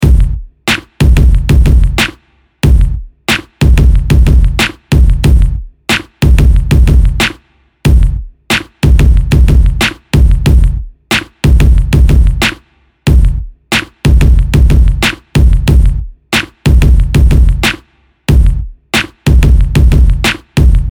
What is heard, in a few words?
Drum loop 92bpm